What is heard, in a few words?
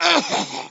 cough